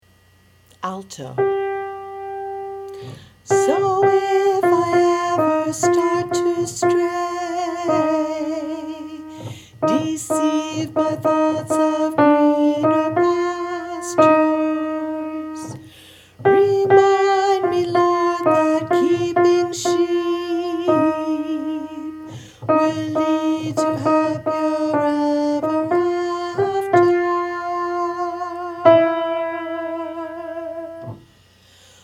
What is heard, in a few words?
alto keeping, Choir